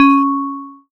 C#, BELL GONG, Synclav FM; Big Fish Audio

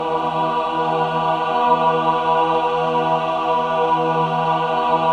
min chord, Symphony of Voices

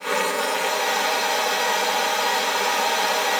F4, alto sax; Xsample VOL-2

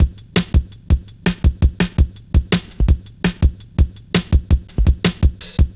reece steppa, drum loops; Zero-G Jungle Warfare 1, 2 & 3

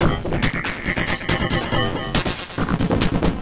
Beat, Industrial

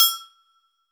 Agogo H Mild; Roland BK-5; Percussion

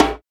PRC HAND, Africa; Roland LCDP14 Africa VOL-2; Afro Menu 1

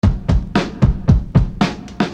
Beat, Hip-Hop Street Beats

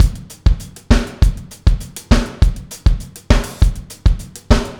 Dancehall 100, Verse, Full Mix, Drums; Sonic Foundry (Sony Creative Software); Drums from the Big Room The Mixes; Demo Projects

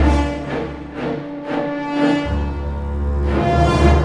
Orchestral Loops; 128 PSYCHO

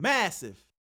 DJX 2B Massive, Yamaha

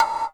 CAN GATE

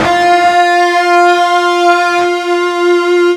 FUZZ F 3 R, Guitar; East West; Steve Stevens Guitar Samples Collection

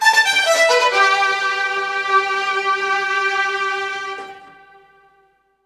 STRG; USB Soundscan vol.32; Roots Disco; AKAI

